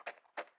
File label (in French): loup; El Rey Arturo